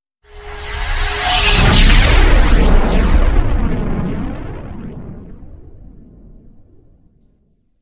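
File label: funny SMS melody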